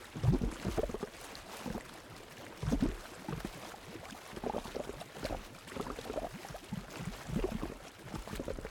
loop bubbles; World Of Goo

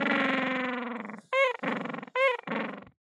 pet penguin

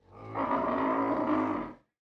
sloth moan; battle

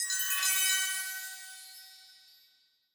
medal get gold; CrossCode

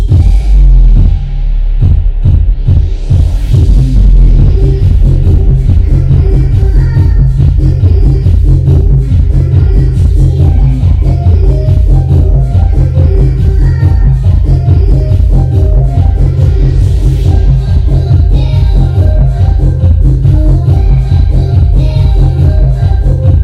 song neighbor music far; Katana ZERO

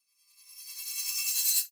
shiny effect reverse; CrossCode; battle

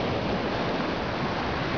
surf; Caesar 2; DOS

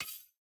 laser step

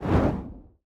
fire generic attack; CrossCode; battle

